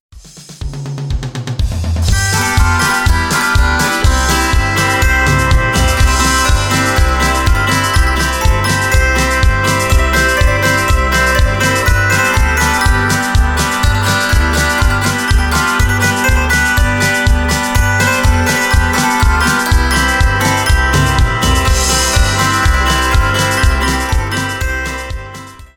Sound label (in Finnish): trilleri polka